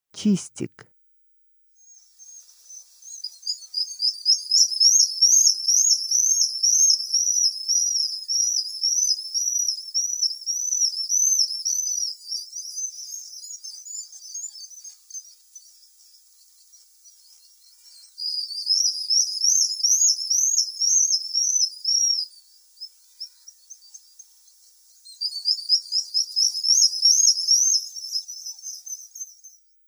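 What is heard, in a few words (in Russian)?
chistik golos, black guillemot voice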